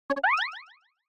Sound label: Single Accent Mid